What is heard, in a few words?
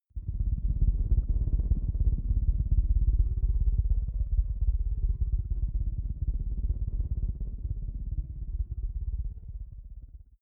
space rotor rocket drive; raumschiff